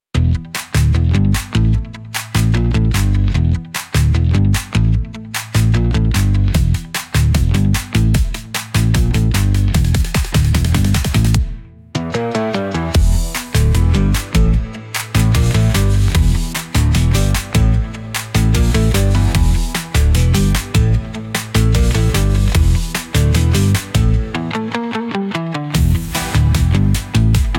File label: Pop